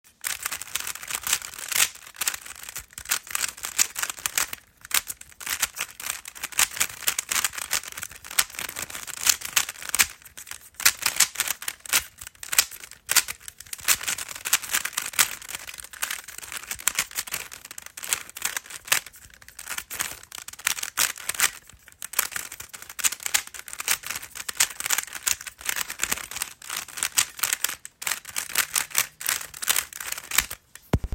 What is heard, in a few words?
Rubik's Cube